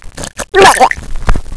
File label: drown; quake2; bong